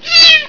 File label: Camel Death; Mythos